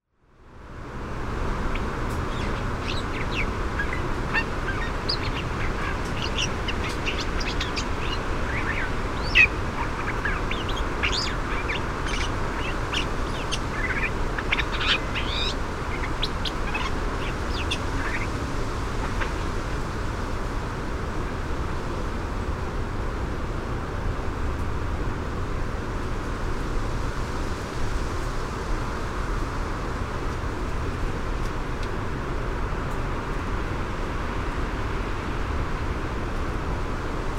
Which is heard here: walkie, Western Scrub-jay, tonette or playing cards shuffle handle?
Western Scrub-jay